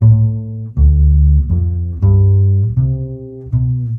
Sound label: loop 4s Am Dm bass